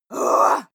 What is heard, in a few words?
female shout upset; schreie; menschen